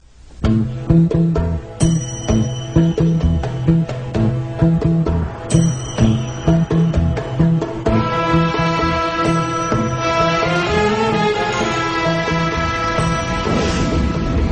arabian night music

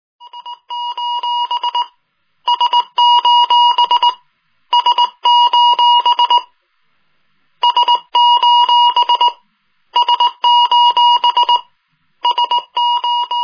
sos morse code

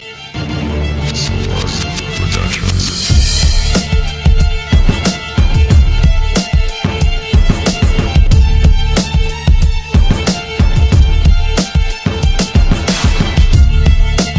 dark rap violin

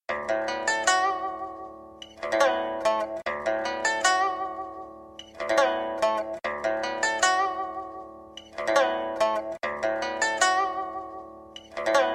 japanese guitar

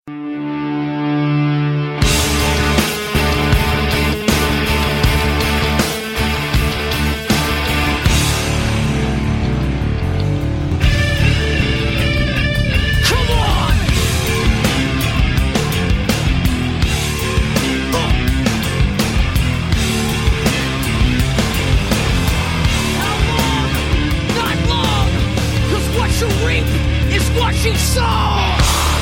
wake up rock

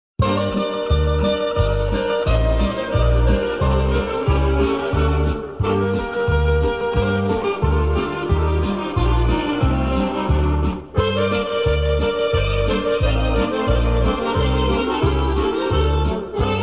tamburashi

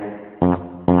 mini fart

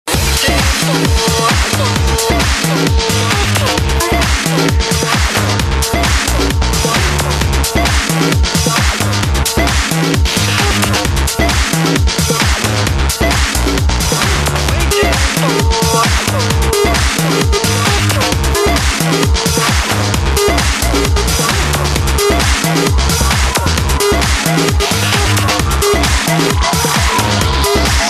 tecktonik

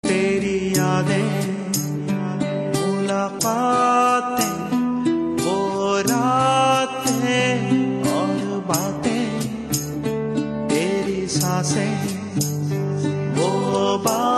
romantic

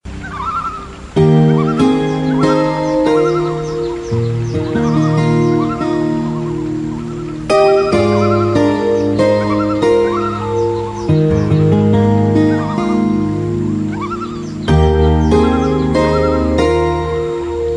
birds music

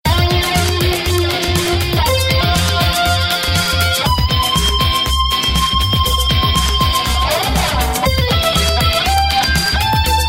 guitar revelry

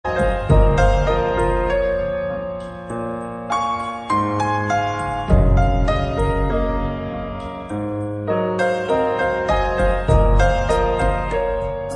awesome peaceful music

